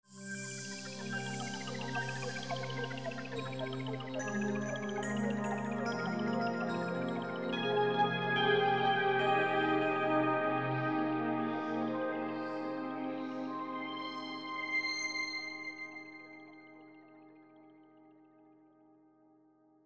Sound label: Atmosferics